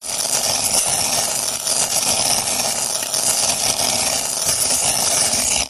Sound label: ice skates skating constand designed